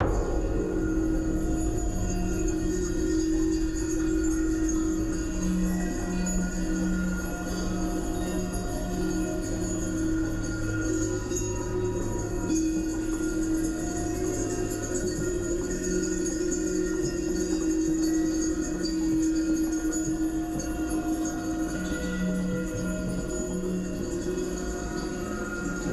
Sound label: ABYSSAL CHIMES D Phrygian 88 BPM